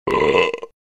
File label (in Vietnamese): tieng o hoi khi an uong qua nhieu